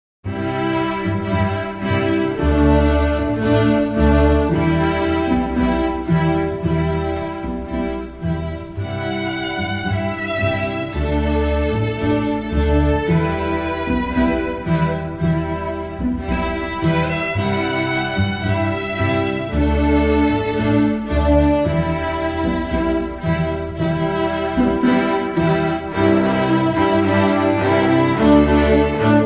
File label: Autumn Rhumba